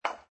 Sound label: Billiard Pool Snooker Cue Hits Ball; Pool cue, snooker cue, billiards cue